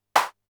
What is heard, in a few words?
res clap, Polivoks, drum machines